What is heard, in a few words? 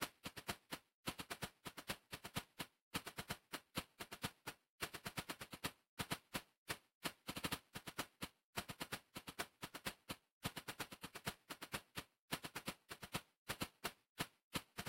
SHAKER; rain world